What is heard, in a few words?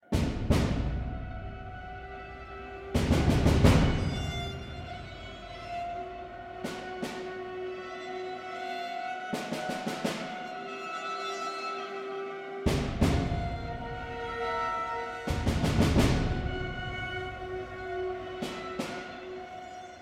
Game Over Music